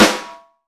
piercing snare drum sound d sharp key, Royality free snare drum tuned to the D# note